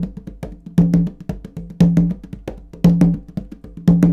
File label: 116 African Conga Grv; World; African Percussion